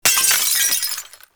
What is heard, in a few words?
Glass Shattering Enemy Shatter; Warframe